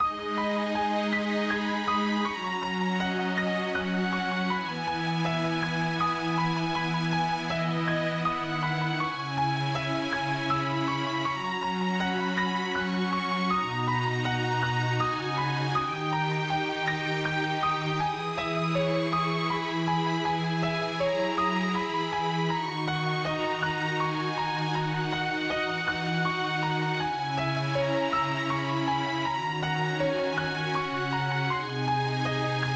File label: Gameover Sad; Across Age DX; Android